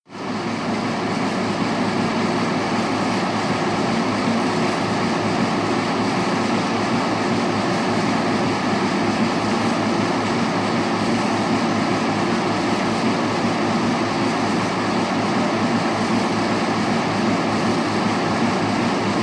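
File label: Ferry Interior Engine Room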